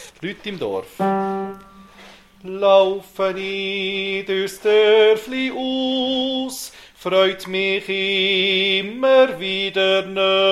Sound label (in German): Bass